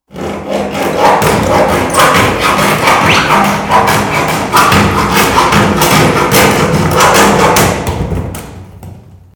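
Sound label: sound of saw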